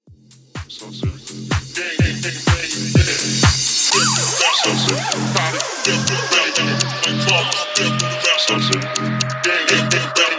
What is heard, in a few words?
Bass House 125Bpm; Mashup